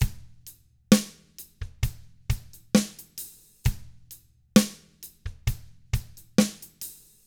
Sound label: SLOW ROCK, Drums; 66SLOWROCK